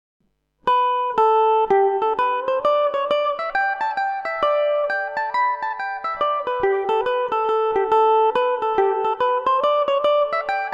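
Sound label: hornpipe G major revelation, Irish mandolin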